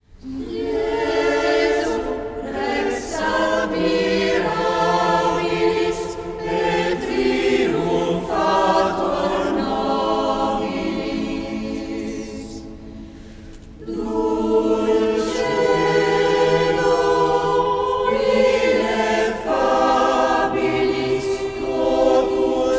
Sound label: Jesu Rex Admirabilis per coro a cappella; Giovanni Pierluigi Palestrina; San Tomaso